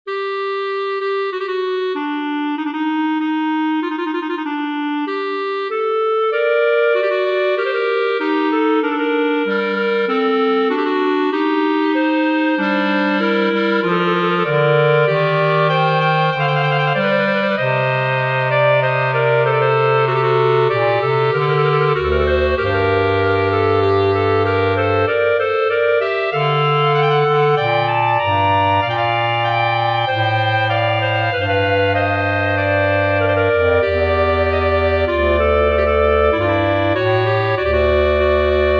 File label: for clarinet trio; cla rambault louis nicolas cromhorne tierce taille from livre 039 orgue